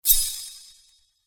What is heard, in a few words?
Sword Sound; FXHome; Fighting Sounds